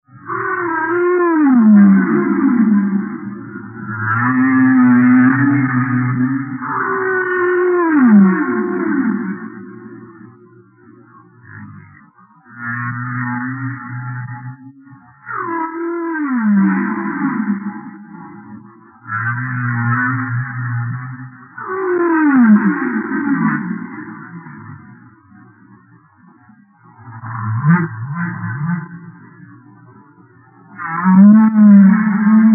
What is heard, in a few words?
humpback whale